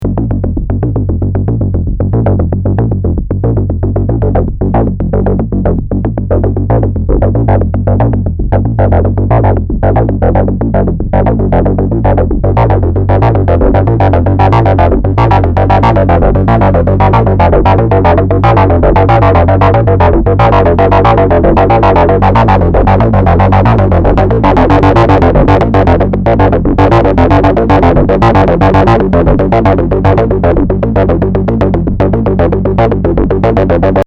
microbrute LFO animiert Sequenz

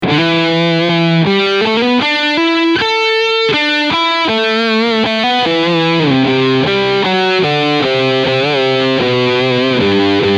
Leadsound Steg PU

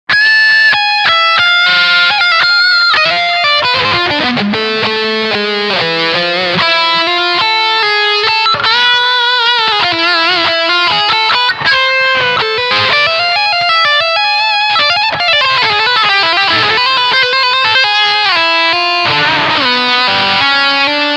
Leadsound Steg PU

